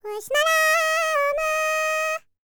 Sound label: HIMALAYAN, World Voices; ILIO; Vocal Planet VOL-5